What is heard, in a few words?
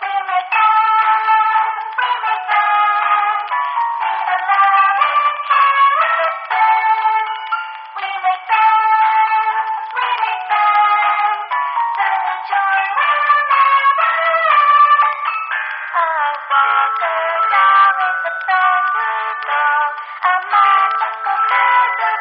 Doll's Song (Vocal); Walker Doll Factory